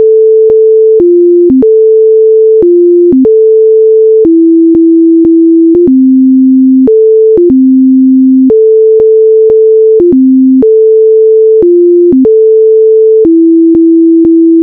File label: sine